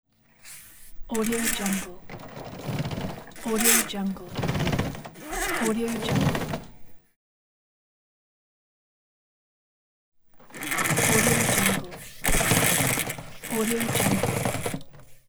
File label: blind